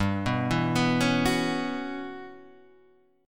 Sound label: E Minor 6th Add 9th G chord